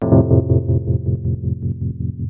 wurl del, dx7

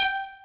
BUG BASS; Pro-Rec - Synthsonic; BASSES

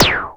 Roland snare, Roland System-100; Drums

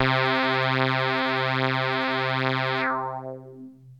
Techno leads, hits and riffs

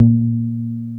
BASS 1; E-MU Formula 4000 Series Vol. 1; Hip Hop Nation; Big Bottoms

